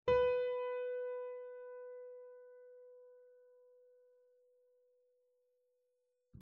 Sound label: Do4 Pianoteq, piano